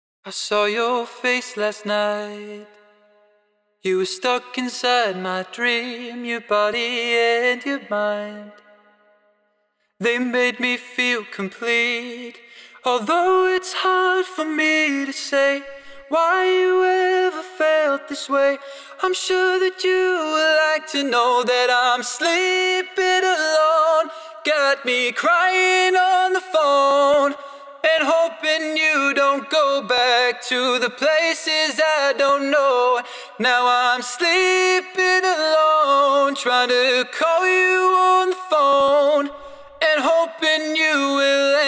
Main Vocal WET, Acapella, B major 160bpm; Ghosthack; Emotions- Acapella Collection

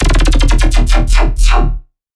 Bass D# Laser Shutdown; Dubstep; Bass One Shots; Ghosthack; Dubstep Sound Arsenal

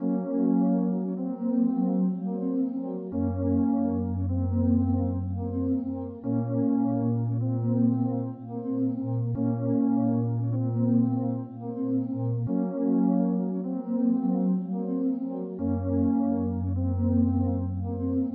77 BPM A# Min Synth Pad, RnB; Melody; Stems; Cymatics; 2022 Melody Collection (BETA); City Lights

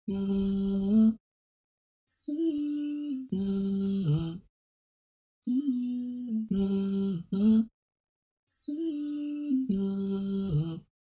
150 BPM A Min Vocals; Loop Stems; Cymatics; Mixed Feelings

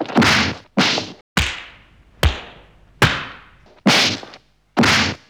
human fist hits, Cartoon Film Effects

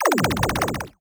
Retro FX; Cymatics; Video Game Sample Pack Vol 1

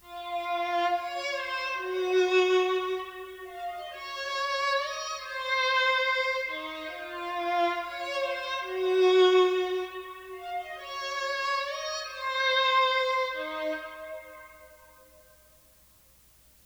Violin, 140 BPM A# Min; Loop Stems; Cymatics; 2022 Melody Collection Platinum Expansion - Pt 2; Plug

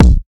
Kick C; Junkie

